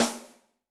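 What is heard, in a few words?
Open Rim, Yamaha DTXpress-II snare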